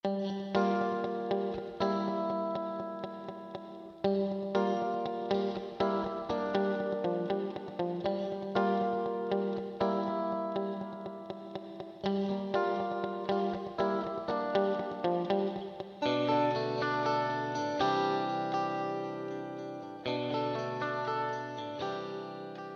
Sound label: Guitar Korg DRV 2000 Large Instrument Reverb Vintage Digital